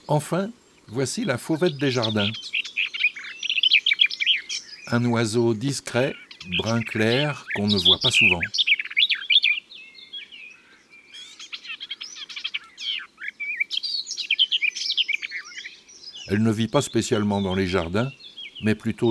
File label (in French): Fauvette des jardins, chants d'oiseaux